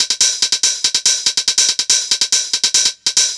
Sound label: NRG Cymbal